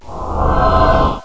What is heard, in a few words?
heal, sfx